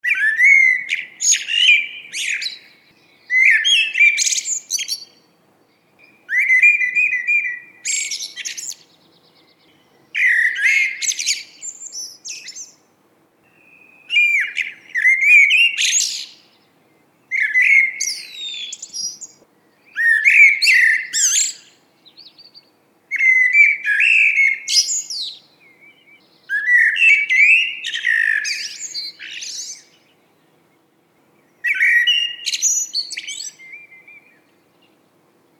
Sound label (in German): Amsel Geraeusche; Wildtiere in Europa